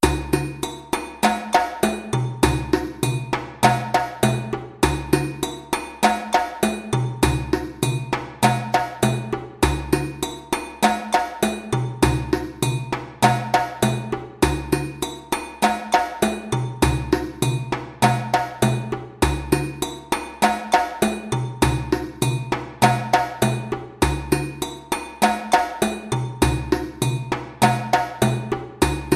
Sofa Groove 100 bpm, djembe

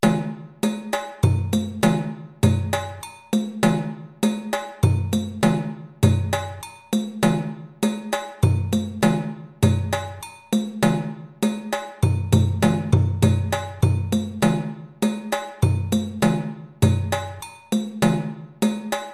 Kènè Foli Groove 100 bpm, djembe

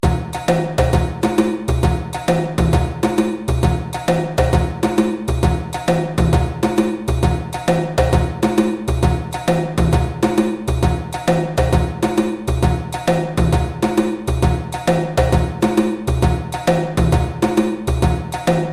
Yankadi Groove 200 bpm, djembe

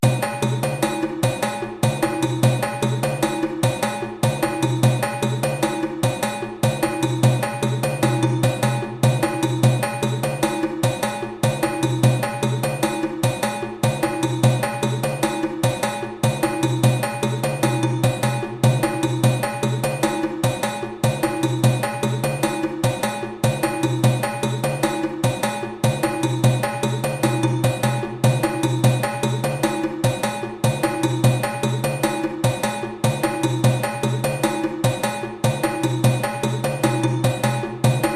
Gidamba Groove 150 bpm, djembe

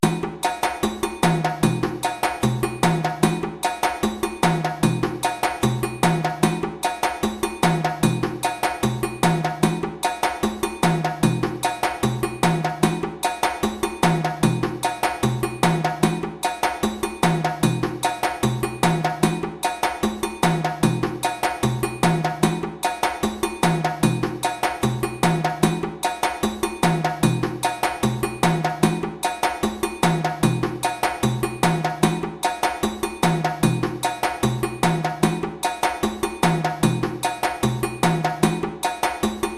Adjos Groove 150 bpm, djembe

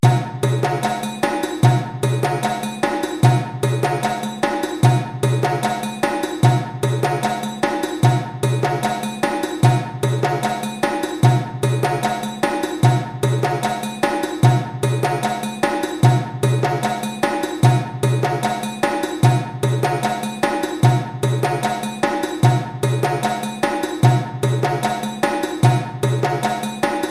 Lolo Groove 150 bpm, djembe

